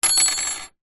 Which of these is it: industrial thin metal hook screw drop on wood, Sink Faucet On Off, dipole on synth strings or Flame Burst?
industrial thin metal hook screw drop on wood